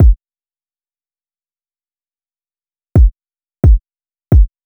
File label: Drum Loop 132 BPM Kick; Loop Stems; Cymatics; Vibes II Drum Loop Collection; Vapor